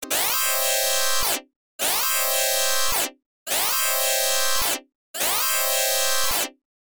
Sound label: Warning; D Return; X68000